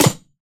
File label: clap one shot d sharp key; single hits one shots